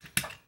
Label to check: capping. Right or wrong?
Right